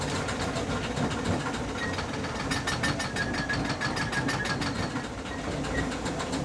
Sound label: Brake Shudder, Train; OpenBVE; Cl313 Silverlink